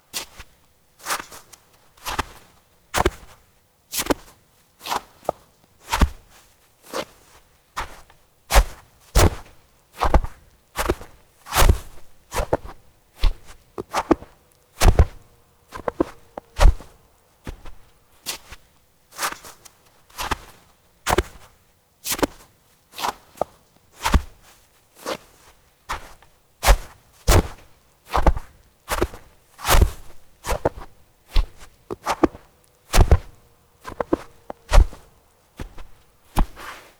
footsteps snow walk

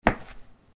Footsteps Concrete Asphalt Street

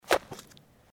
footsteps snow

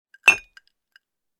Glass with ice Cheers

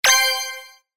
break and chain

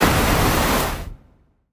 water magic small; 魔法/法术的音效

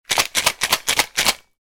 Shake a cocktail shaker

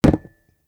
Put down the beer mug

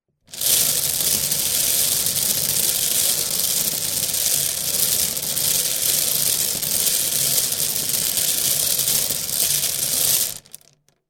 Spin the bingo cage; board game casino mahjong sound effects

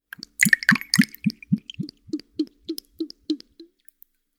Pour the cocktail into a cup; alcoholic drink

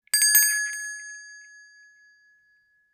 call bell; lifestyle

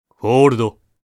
Fold, board game casino mahjong

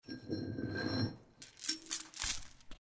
Footsteps Chain

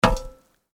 Footsteps Iron plate One

